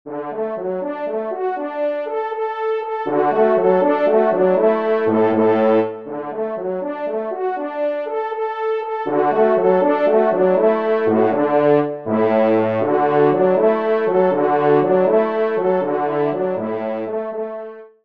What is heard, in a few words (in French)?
Fanfare; Les Loisirs du Chasseur n°8; Pupitre 3° Cor; Henri Sénée